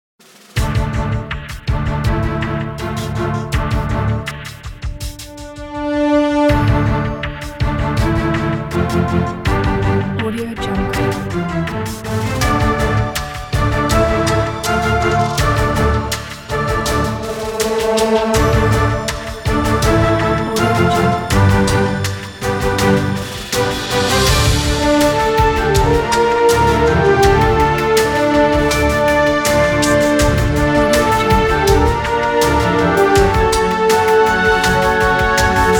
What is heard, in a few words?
game battle